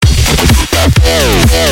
Rock N Roll Notific

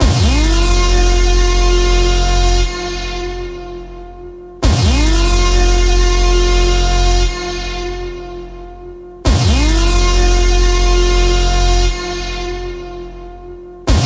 Slow Alert

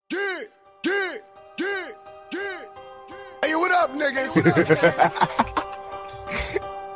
hahaha

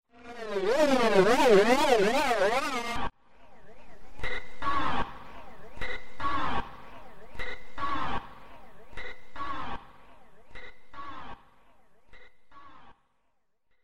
Oddly Weird

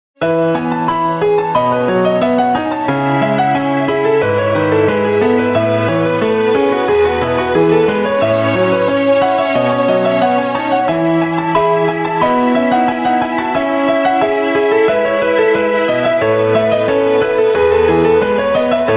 Canon Ringtone

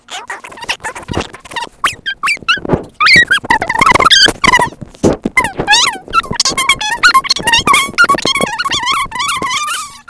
Funny Flies Talking

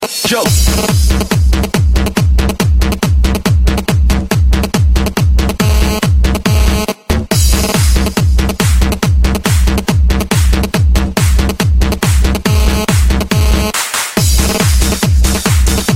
Phone Beats Ringtone

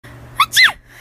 sneeze

